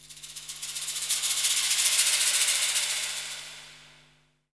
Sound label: SHAK, Hip Hop R n B; USB Soundscan vol.19